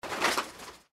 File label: mantis land; npc